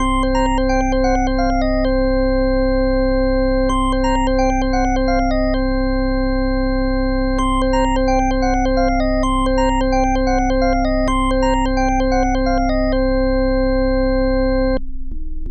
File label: organ, hammond